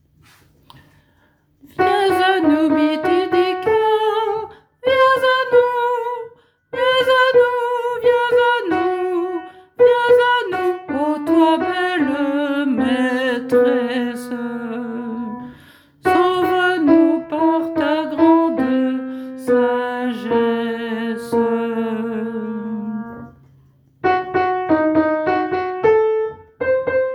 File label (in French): Alti la zingarella